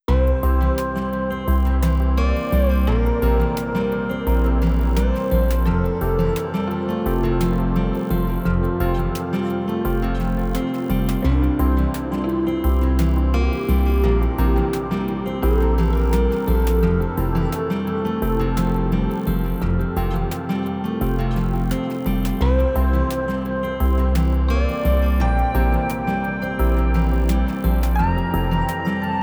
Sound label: Grasshopper Song; Summer Catchers